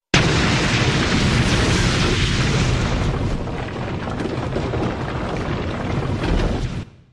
explosion debris

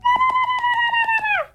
milk noises

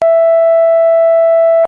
beeper

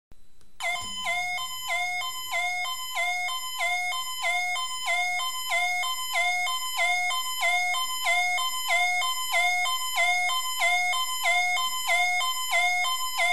alarm

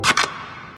voting increase score; squid game

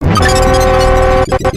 slash sound; sonic exe

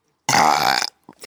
burp

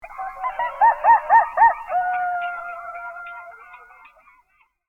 coyotes

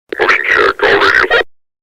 motion check

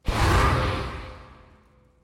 alert sound

